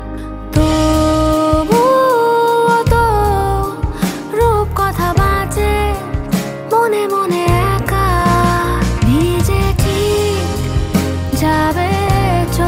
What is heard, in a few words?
bengali ringtone